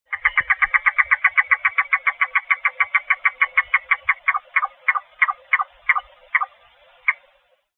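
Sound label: yellow-billed cuckoo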